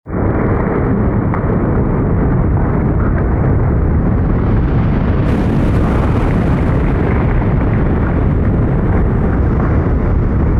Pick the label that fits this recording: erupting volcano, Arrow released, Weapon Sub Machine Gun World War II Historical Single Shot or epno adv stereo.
erupting volcano